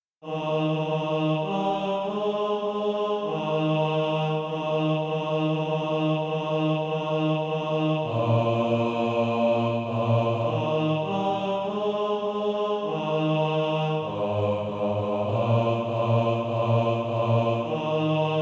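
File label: bass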